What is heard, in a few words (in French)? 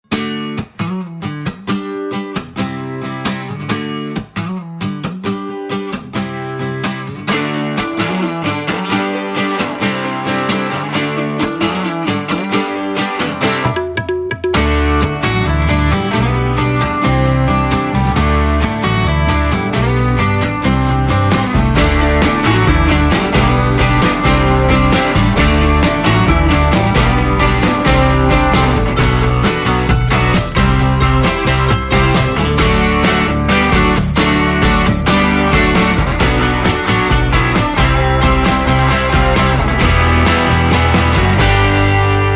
acoustique version longue